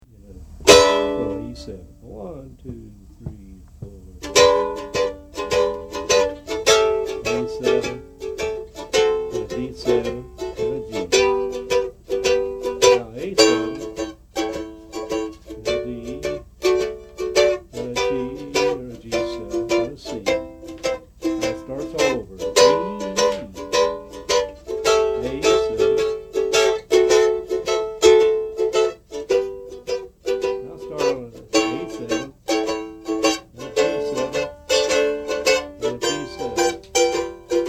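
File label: Chords, mandolin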